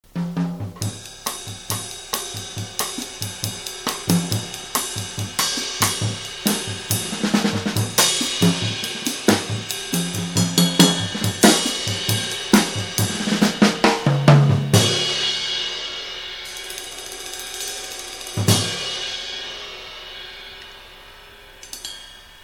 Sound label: jazz